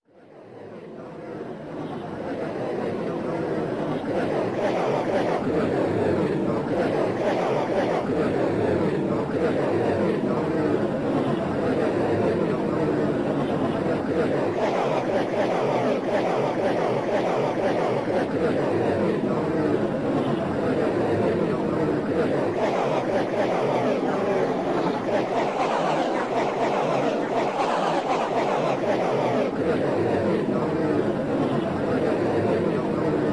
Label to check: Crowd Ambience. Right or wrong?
Right